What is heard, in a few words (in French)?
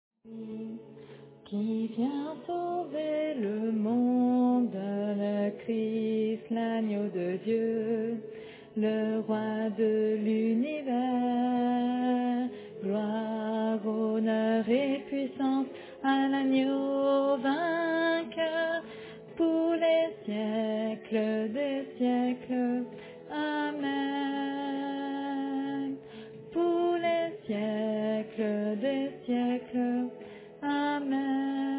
Tenor